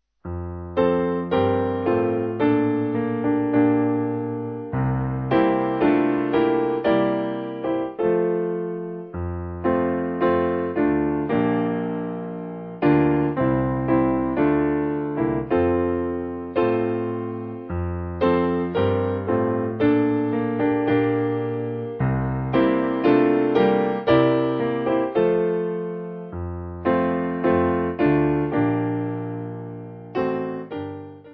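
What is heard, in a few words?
Piano, Music Backing Files for Churches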